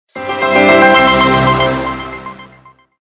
receive message, notifications; ZTE V790 KIS II T-Mobile

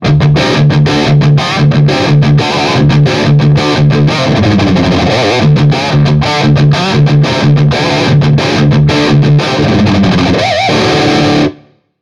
Rock, ringtones; Marshall; London KB 1501

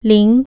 digit 0, keypad tone; ZTE F451

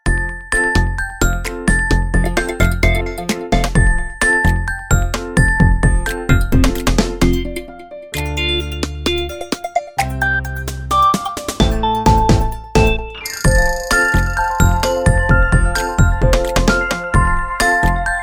Bouncing, ringtones; Itel; itelOS 13

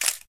camera click; Tecno Spark 40C; ui

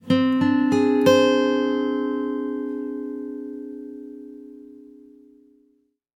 Acoustic

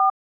Key 4, Key Tone Beep; Samsung; GT-S8600 Wave III

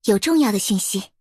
Sms, notifications; OnePlus; Ace 6T Genshin Impact Kamisto Ayaka

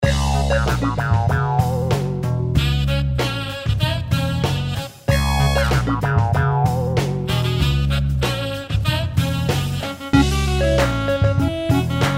ringtong; Gionee V185

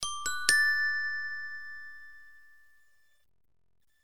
Celesta; Coolpad; ESurfing-No1-2021

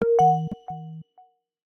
Woodblock Echo, notifications; Samsung Galaxy S25 Ultra; Calm

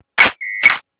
camera shutter

